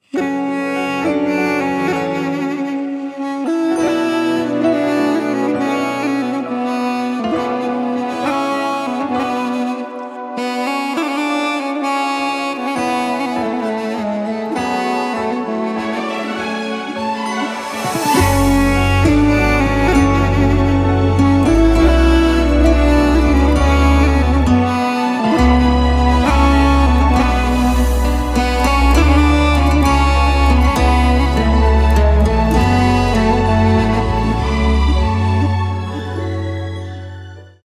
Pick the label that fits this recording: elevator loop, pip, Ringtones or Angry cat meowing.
Ringtones